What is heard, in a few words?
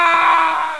RABIES, WIN DOG